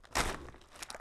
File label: Rocky Step, outdoor, Footsteps